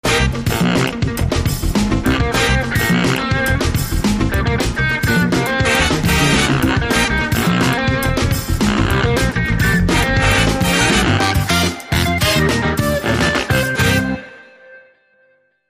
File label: Freewheeling Funk